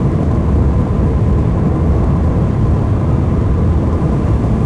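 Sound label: wind turbine loop; Star Wars Obi Wan; XBOX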